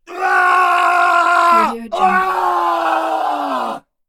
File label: man screaming twice